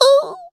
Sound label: frustration